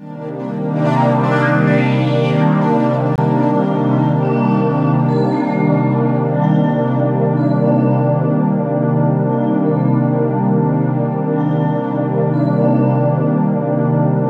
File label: sweep, ambient atmospheres